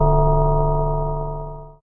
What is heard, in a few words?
die; watertowerclassic